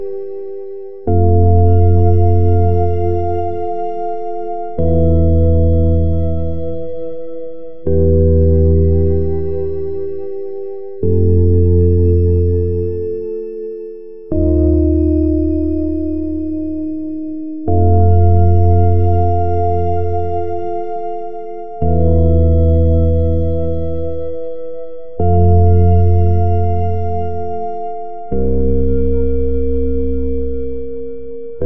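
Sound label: light unobtrusive background, chillout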